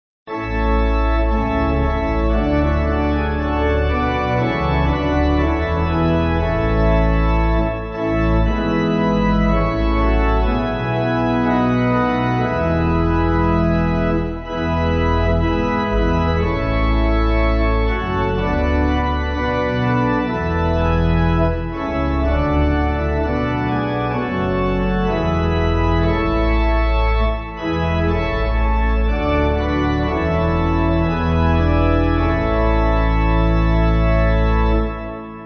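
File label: Pipe Organ, Music Backing; Broomsgrove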